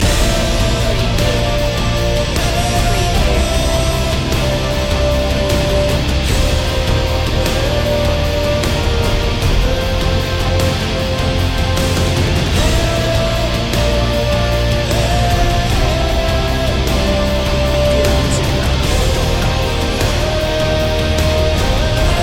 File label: orchestral soundtrack, epic themes